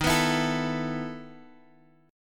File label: E Major 7th Suspended 4th Eb